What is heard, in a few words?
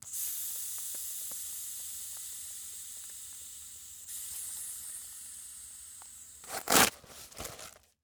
Sphygmomanometer, Medical; Blastwave